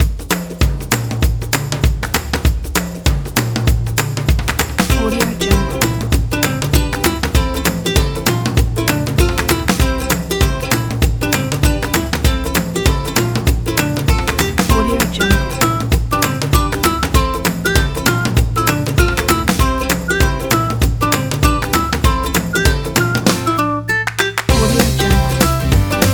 upbeat ukulele fun

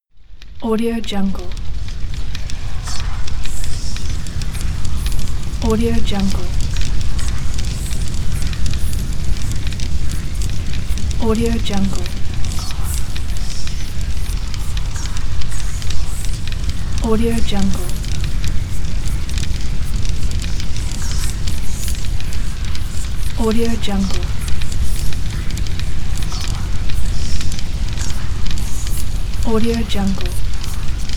whispering evil fire

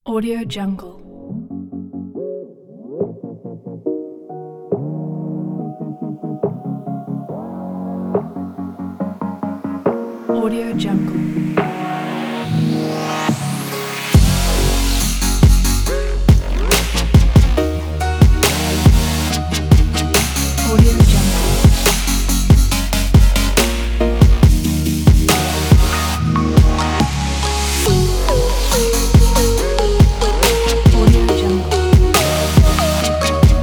future bass; youpiwmeister

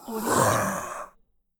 horror ghoul monster moan

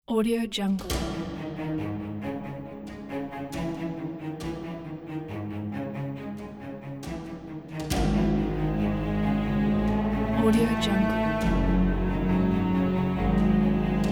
defeat; davidbutler4

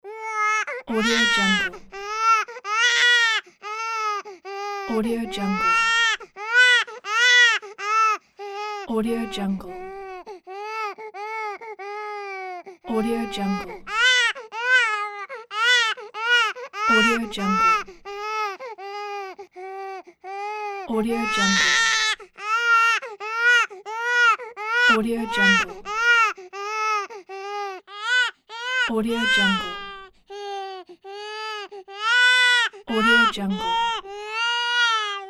baby crying loudly loop

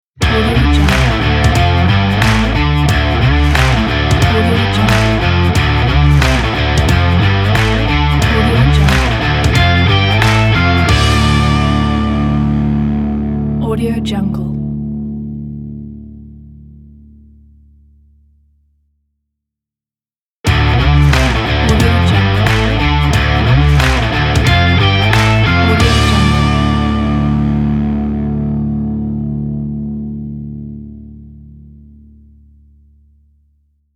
stomp rock logo

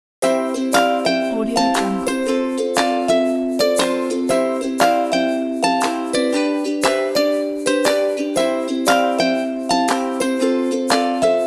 holiday family ukulele; audiowinner

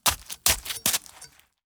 stab punch hack

